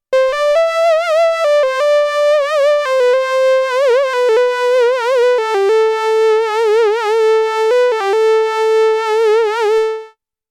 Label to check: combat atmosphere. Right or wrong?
Wrong